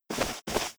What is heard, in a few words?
Run; ArkNovice; Gamigo